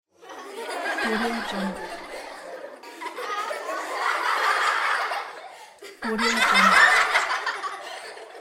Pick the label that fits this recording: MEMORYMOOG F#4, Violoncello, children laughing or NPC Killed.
children laughing